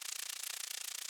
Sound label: Purple Teleport Focus